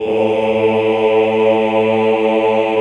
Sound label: CHO, Roland; Sound & Vision - Gigapack I CD 2 (Roland); Mix-Combi 1